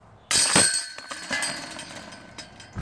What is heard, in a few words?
Disc golf Chains